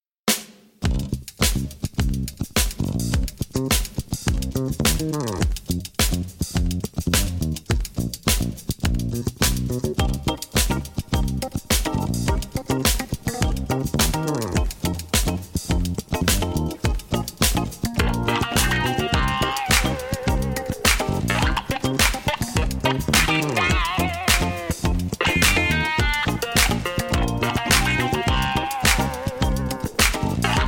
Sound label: music